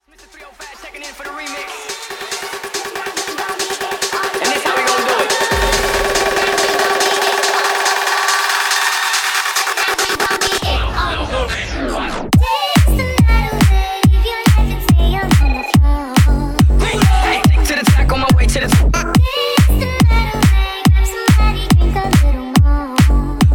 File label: epic ringtones; samsung